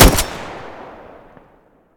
groza muzzle mono, weapons

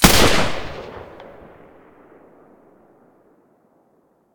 silencer g36 mono, weapons

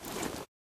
draw, weapons bolt

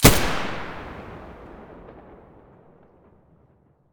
muzzle g36 stereo, weapons

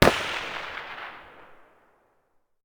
M4A1 muzzle mono far

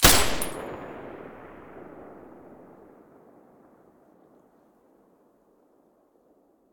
silencer M249 stereo last

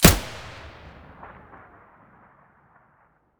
vihr muzzle mono, weapons

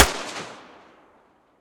muzzle p220 mono distant, weapons

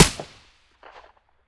aps silencer stereo; STALKER; IWP

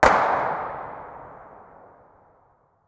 groza muzzle mono far, weapons

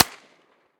silencer mp9 mono distant, weapons

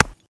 bolt, material; STALKER; IWP

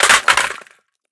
riffle drop tile; STALKER; IWP

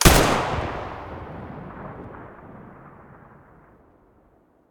ak105 muzzle stereo, weapons; STALKER; IWP

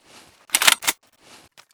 aa12 misfire, weapons; STALKER; IWP

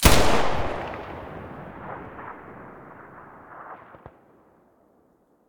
muzzle fn2000

